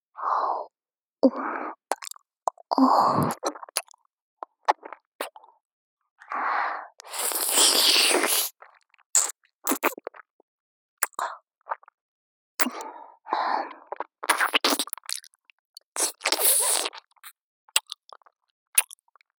妹の左耳舐め ループ, ASMR

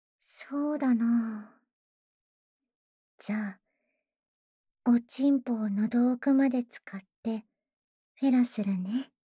ダウナー 喉奥フェラ, ASMR; フェラ素材

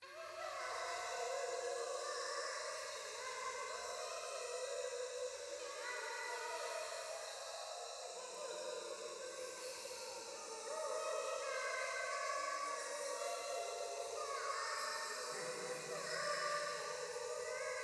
ゴースト 可愛い喘ぎ 山奥夜

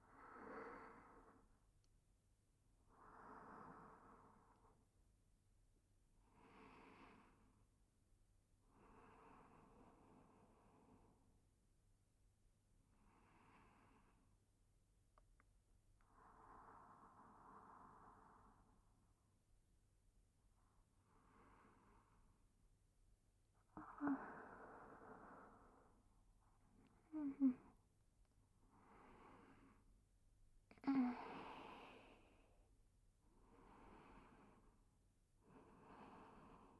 超密着添い寝＆寝息, ASMR

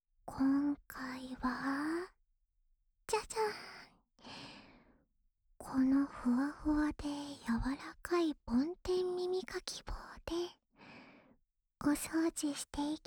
安眠用梵天耳かきループ（右耳）, ASMR

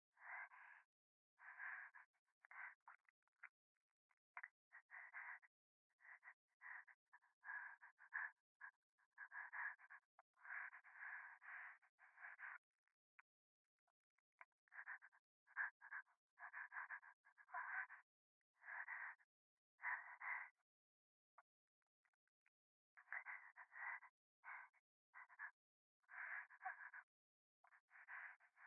右耳 快感 吐息

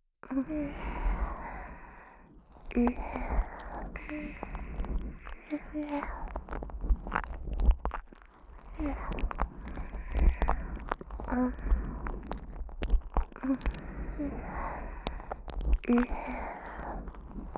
ずーっと耳舐めするだけ(両方)

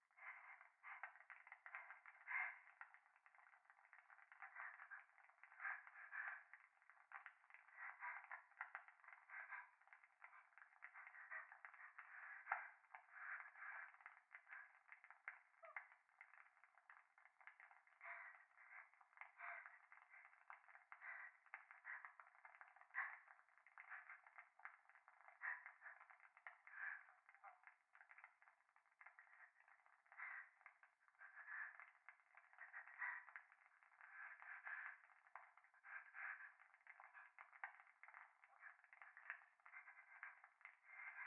風呂 左耳快感吐息 暖炉

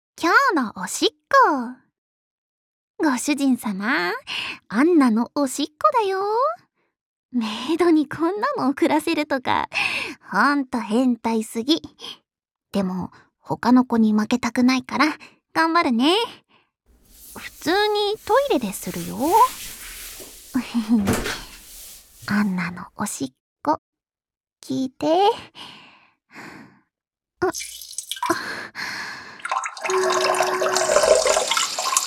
普通にトイレでおしっこ

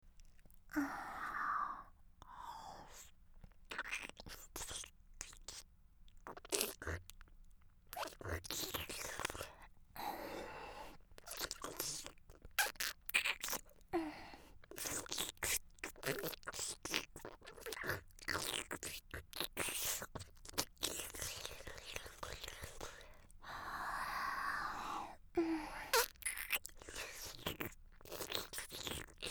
フェラ音のみ

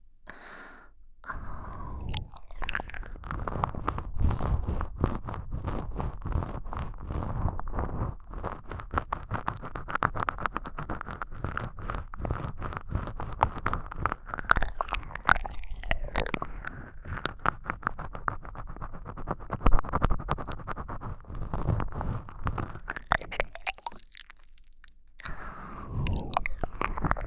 耳奥貫通コース

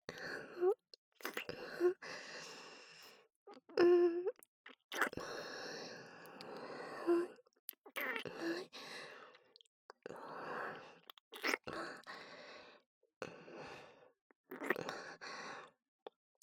キス

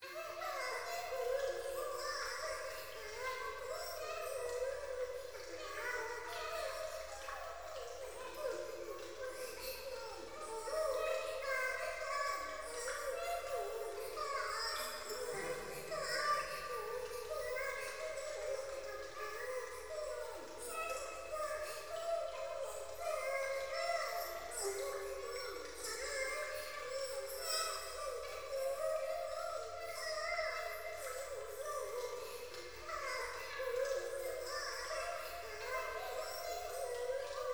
風呂 可愛い喘ぎ 雨上がり

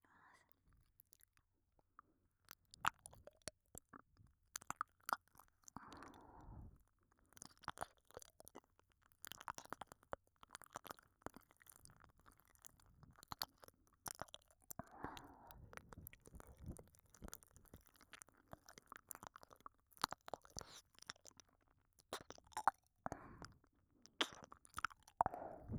耳舐め

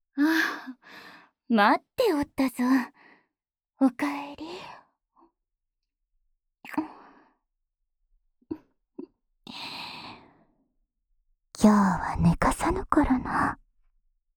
おかえりのキス; 闇クラウリーナ

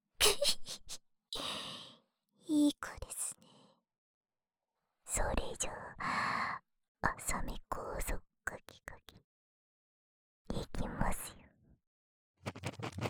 【右】浅め耳かき高速, ASMR

